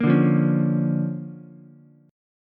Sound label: D-Minor Major 7th Sharp 5th-D-down-Ukulele; DmM7#5 strummed; JGuitar